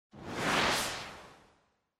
Camera Motion Dry; シーン・カメラモーション

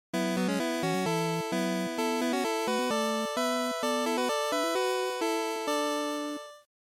GB General Clear

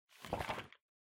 Calendar Noise

